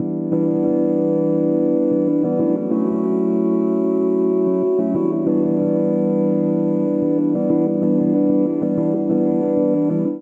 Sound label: D Major 94 bpm; MIDI Clips; Tonal; Accompaniment; Dub Brigade; Drive and Glow; Ableton; Factory Packs